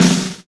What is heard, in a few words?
XV Snare, Roland XV-5080